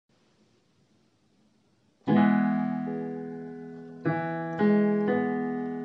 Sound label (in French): mi maj5